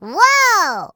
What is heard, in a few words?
Caramel Miss Sound; Jolly Battle; Android